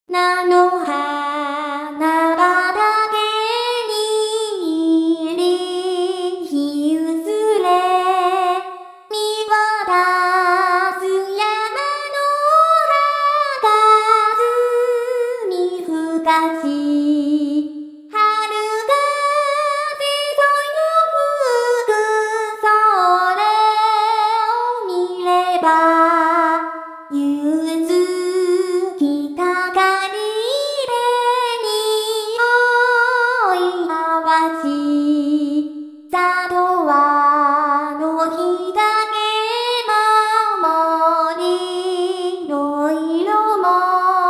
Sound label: hazy moon voice